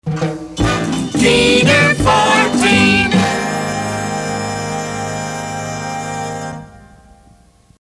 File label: Time Chime; WKFR; PAMS